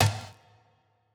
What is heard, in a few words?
Snare, Dubstep Snares